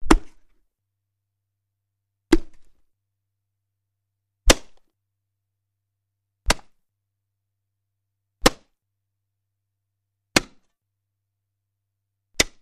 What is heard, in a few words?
Bone Impacts Large; gore stabs & eat